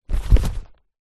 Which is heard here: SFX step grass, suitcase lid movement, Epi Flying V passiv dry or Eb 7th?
suitcase lid movement